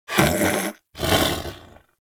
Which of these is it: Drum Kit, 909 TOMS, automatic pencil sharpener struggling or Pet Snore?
Pet Snore